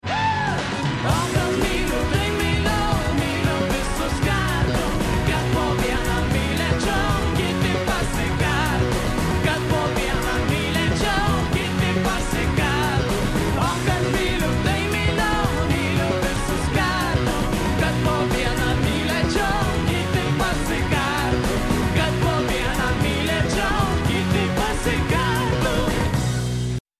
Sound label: Folk